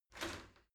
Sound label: shower slide door slide short open